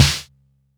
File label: Snare Noise, drums; One Shots; RKTD1